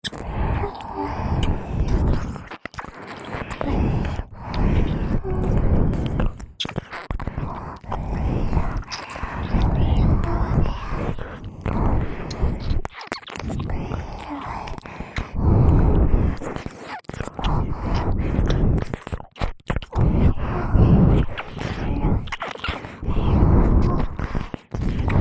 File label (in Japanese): キス 吐息交じり両耳舐め 通常耳舐め